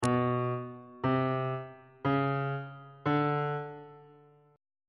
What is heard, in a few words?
g double sharp dim3 min3 maj3 aug3 intervals on bass clef